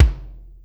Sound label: DANCE SET, DRUMS